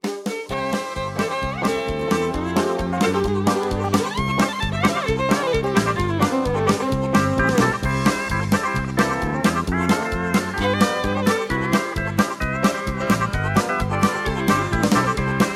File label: Instrumental